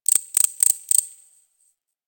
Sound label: Forest Close Insect Chirp; Warframe; Grineer Forest